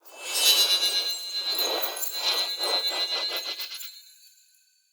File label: Throwing Knives Orbital Projectile Start; Pothia